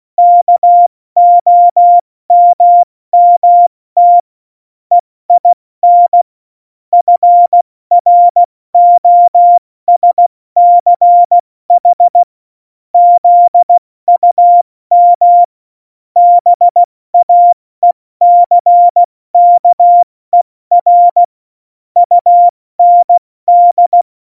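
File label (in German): Witz, Morsekurs, cw morsen; Tempo 70 F80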